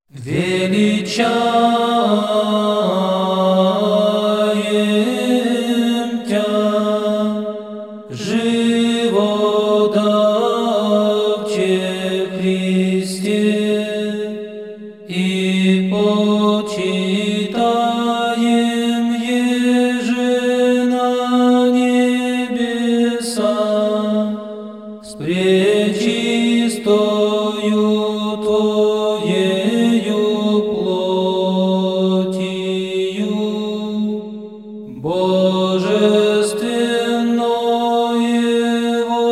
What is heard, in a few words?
Hor bratii Valaamskogo Svyato Preobrazhenskogo monastyrya Voznesenie Gospodne Velichanie